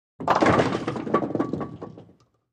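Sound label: Bowling Pins Are Knocked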